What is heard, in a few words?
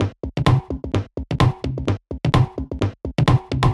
Toploop 128